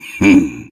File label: hmm; Kinzo